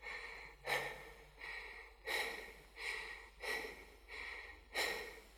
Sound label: Heavy Breathing Off Mic Loop